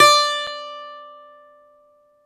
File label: BALALAIKA, Prima Balalaika, Plucked Strings